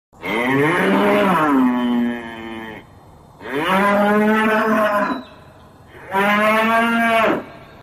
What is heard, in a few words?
bull braying